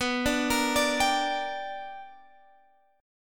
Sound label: B-Minor Major 7th Sharp 5th B down Guitar Standard; BmM7#5 arpeggiated; JGuitar